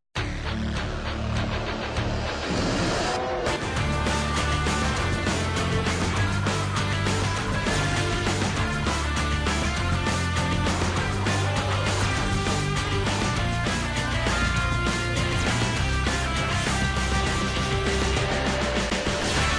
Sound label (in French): musique generique instru